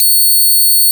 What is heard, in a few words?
Shenai D6